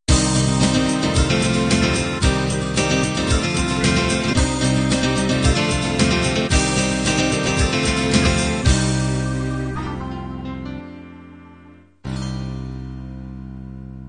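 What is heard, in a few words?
pop 16 beat end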